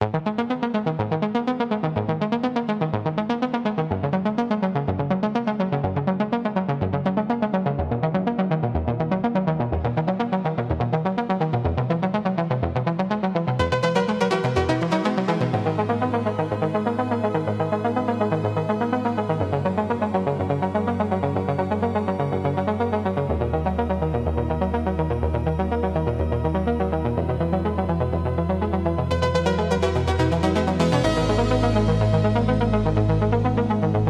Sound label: Electronic Impulse